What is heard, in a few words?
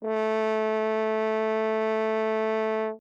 Horn ff A3; Horn.ff.A3.stereo; timbre